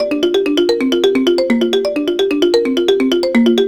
Marimba; Sonic Foundry (Sony Creative Software); World Pop; Percussion

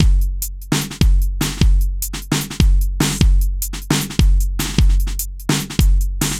V Drum Loop

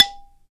BALA, Balaphone

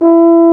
BRS BARI E3, Euphonium; Roland L-CDX-03 Disk 2; Baritone Hrn

